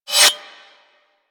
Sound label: Tonfa Blade Spin; Warframe; Tenno